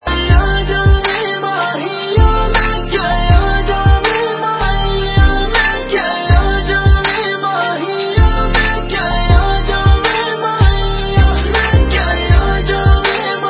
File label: romantic ringtone